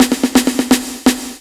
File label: FILL DRUM; USB Soundscan vol.27; FILLACOUS